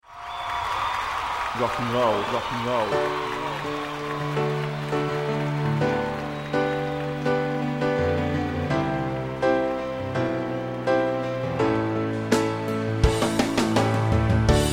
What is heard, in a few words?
D String Rock Track